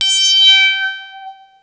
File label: Arp E G5, ARP Odyssey; musicradar-synth-samples